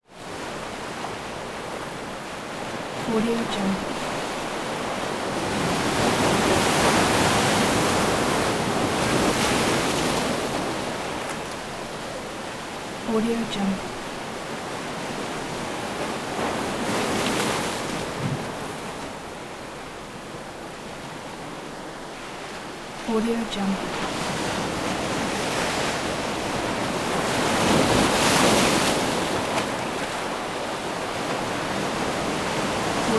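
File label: surf break up on rocks close up wave impact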